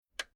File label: Transport Car Classic Dashboard Radio Dial Turned On; Bentley Eight; Tom Hutchings